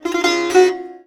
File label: SITAR GRV, World Colours; Best Service